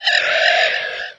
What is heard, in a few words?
scrm